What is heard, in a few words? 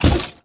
step walk